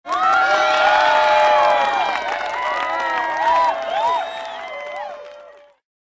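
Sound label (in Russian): реакция восторженная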